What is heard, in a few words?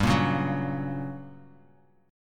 G Minor Major 7th Flat 5th G, Bass Standard; strummed; 15,13,11,11